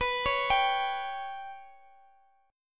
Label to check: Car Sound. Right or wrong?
Wrong